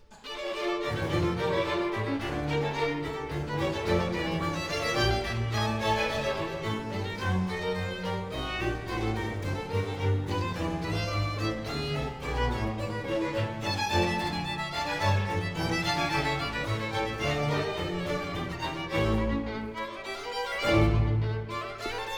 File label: Concerto for Two Violins; Bach